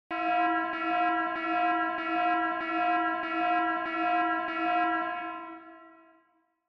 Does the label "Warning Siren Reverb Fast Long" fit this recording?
Yes